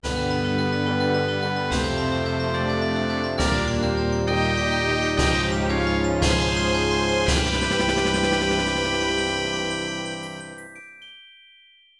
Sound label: Medal Get Fanfare; Ocarina of Time